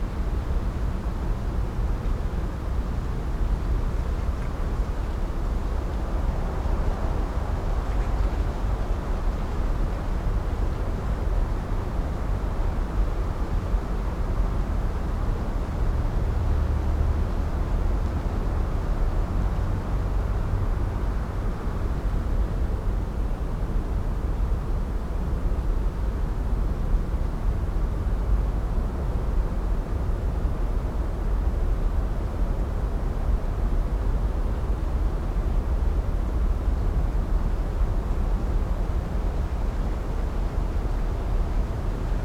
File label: Urban din night traffic rumble; urban and suburban; boom library